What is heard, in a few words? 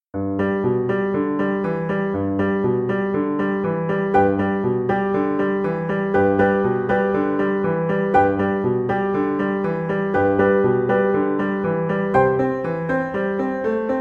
carouselle, Flute